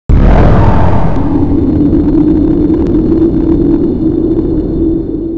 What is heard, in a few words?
warp